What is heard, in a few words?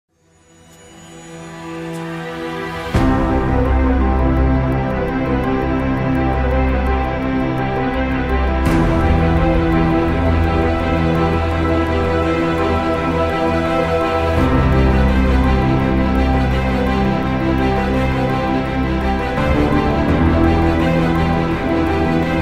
theme orchestral cover; stranger things